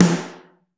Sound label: SN2 Mono, Snare; Ableton Foundation; Snare Designer